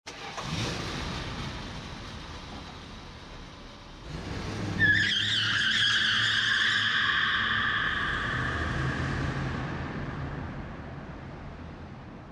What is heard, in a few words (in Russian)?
v gulkom pustom pomeshhenii